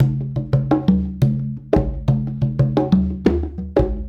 Bonga; Essential Percussion

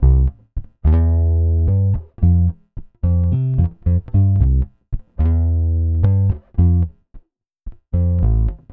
Pop, Bass

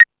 Percussion, Drum Machines E-MU Pro-Cussion

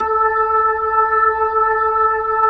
B-3 Jazz; A 5; Keyboards of The 60's and 70's

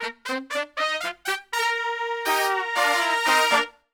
POP BB, Brass; Best Service; Brass Super Section; POP LICK

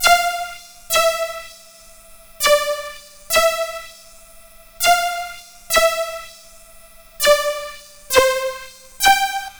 C64 Synth

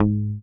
HOF MUT G#2, HOFNER MUTE, Bass

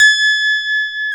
Digital E.Pianos, Roland; Digital EP's

